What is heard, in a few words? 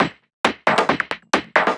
Dance Grooves